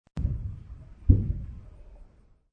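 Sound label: Distant Artillery Double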